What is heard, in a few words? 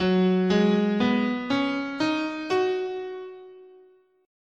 F sharp Yo, scale